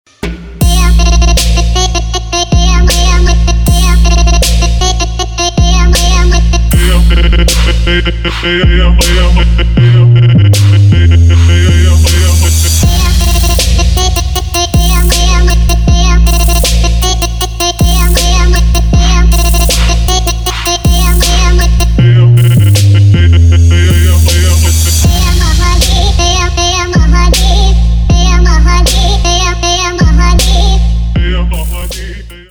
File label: Arab trap ringtone, Arab trap remix